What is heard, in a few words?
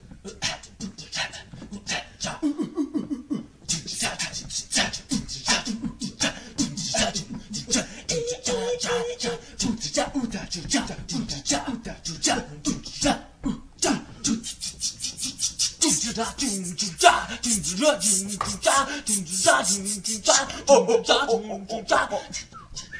mouth box